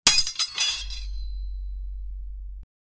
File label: medieval war battle armor; arrow